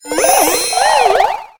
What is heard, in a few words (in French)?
Cri